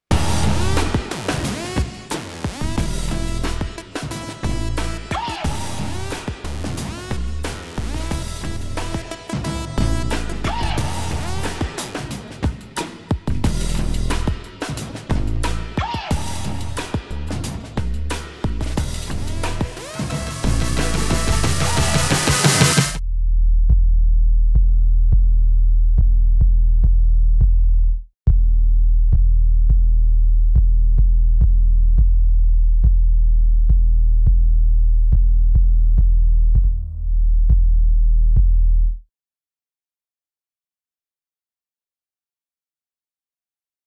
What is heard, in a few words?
Electro; OF FATHERS AND SONS – Soundtrack